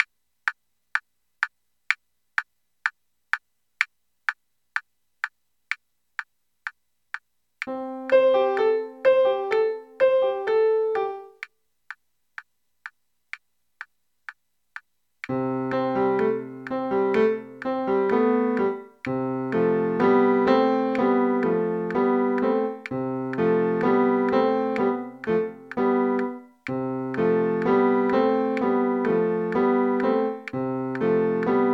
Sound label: secondo